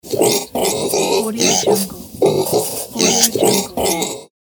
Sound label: baby pig grunts